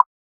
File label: Pop